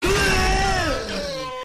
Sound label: bleeeeeeeeeeeeeeeh